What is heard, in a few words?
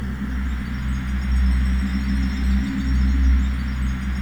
ATMOPAD; Best Service; XX Large; Pads